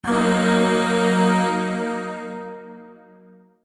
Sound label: Shawzin Yo Chord C; Warframe; Zariman; Void Shawzin; Chords